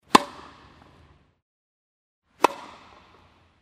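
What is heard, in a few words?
indoor tennis sound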